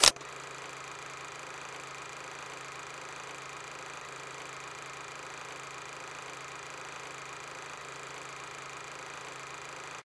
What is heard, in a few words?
shot more processing low, camera; Samsung; Galaxy S8; SamsungCamera7